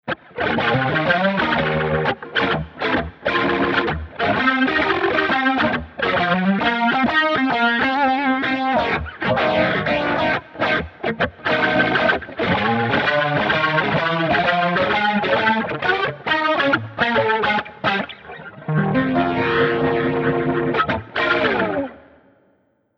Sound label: BOSS GT1000V3 Match Combo Centa OD ROTARY REV